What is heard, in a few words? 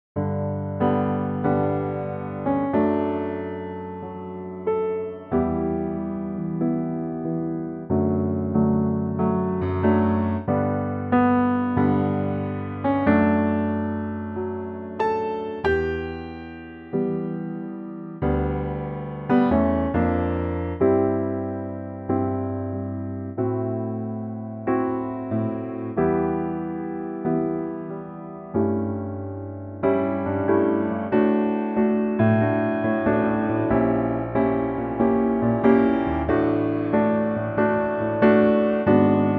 piano D; vocal range - D to G